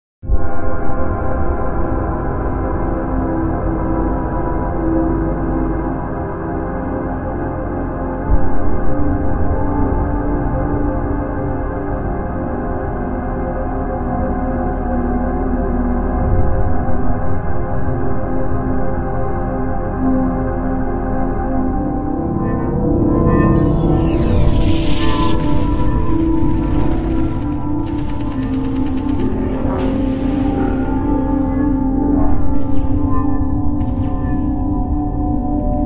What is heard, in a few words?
(Ambient Version)